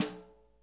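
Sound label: SNARE DRUM, JAZZDRUM; Ueberschall; Drum & Bass Flash Trackz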